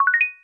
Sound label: drip rising